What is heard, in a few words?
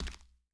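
Foot Hard Wood Sneaker Walk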